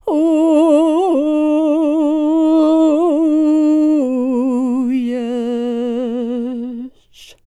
SERBIA, Vocal World Voices SERBIAN

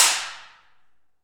PRC ALMWA, Aluminum Wall; Rhythm Section; FX Perc

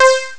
Digi Pop, Amiga Soundtracker; ST-XX Amiga Soundtracker Sample Packs

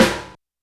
Snare Break, YAMAHA RM1X

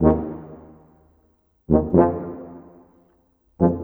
Trombone Tuba; Sonic Foundry (Sony Creative Software); Orchestral Series VOL-4 - Rock and Pop

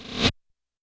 Reverse Roll, YAMAHA RM1X

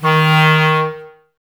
Clarinets, Wood Winds

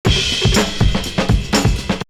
Beat; AMG

